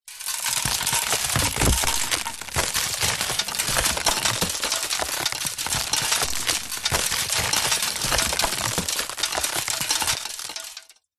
impacts wood and metal wood stress crack metal debris rain down